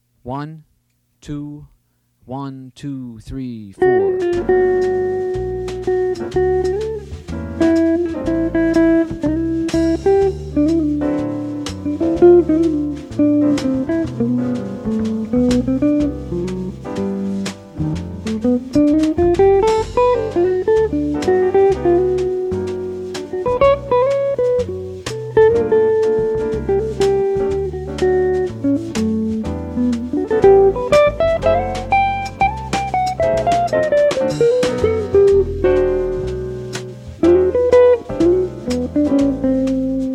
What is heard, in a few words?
jazz